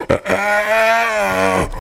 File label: Hyena Bigger Gasp Cal